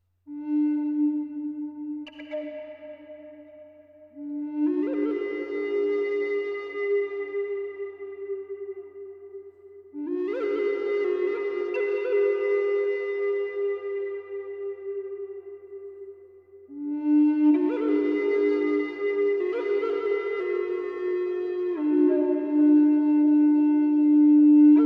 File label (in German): Weißtanne Dis tief 432Hz Hall